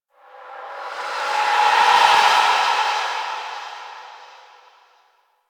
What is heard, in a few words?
Whisper Air Draft; Ambience; Warframe; The New War; Quests; Zariman